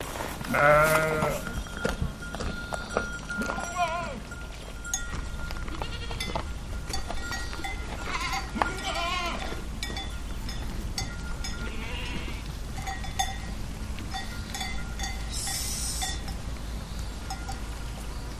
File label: Sheep Goats General